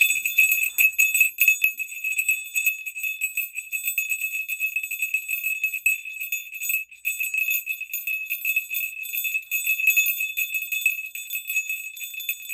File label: large petal bell